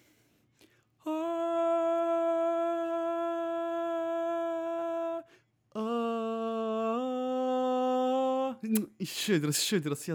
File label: tenor